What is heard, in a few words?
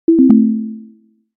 drop, calls